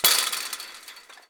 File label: fence; firefight